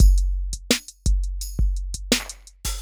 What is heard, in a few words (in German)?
hip-hop drums hihat tamburin